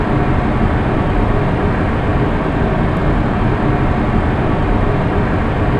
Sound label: machine ambience; Quake 4 Alpha